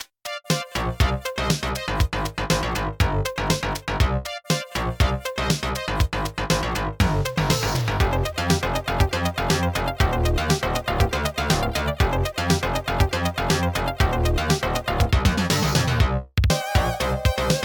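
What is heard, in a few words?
instrumental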